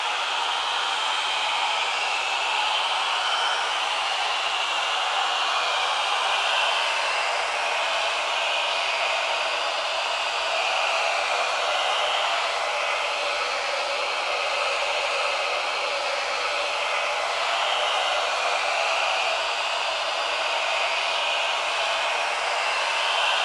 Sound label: ambience pipe